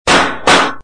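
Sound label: two shots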